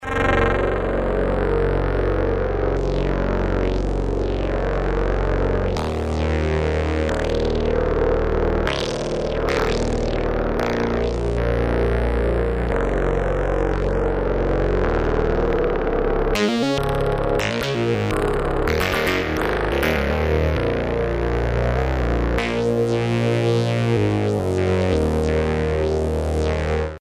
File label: korg z1; synth